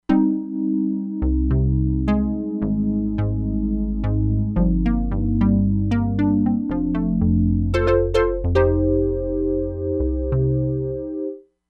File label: PULSE DECAY; Crumar Bit 99; Factory Programs